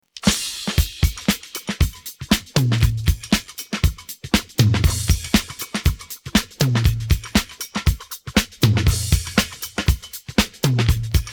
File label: pattern, drums